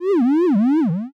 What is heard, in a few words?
curse